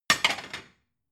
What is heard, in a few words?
plate shelve slide hard